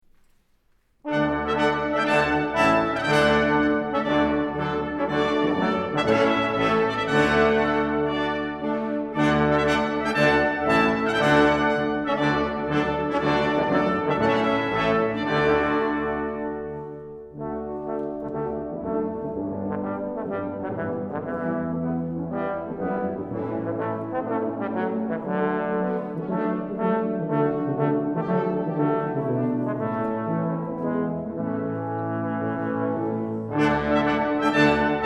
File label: Fanfare